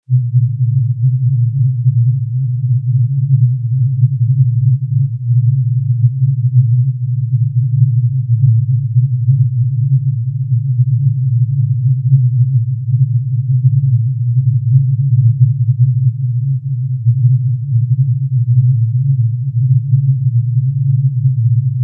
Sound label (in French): bruit rose